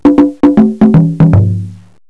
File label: Conga, drumtraks